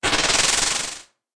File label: rolling